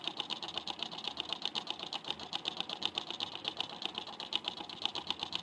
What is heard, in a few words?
clock (electric); devices accessories utilities; NS Studios - ultra sound collection